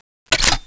plasma gun clip in

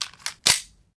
clip in, weapons

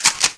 reload; uts15

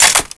bolt slap, weapons; ump45

